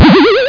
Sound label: Weird Sound; CephaloTron